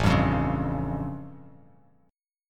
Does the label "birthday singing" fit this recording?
No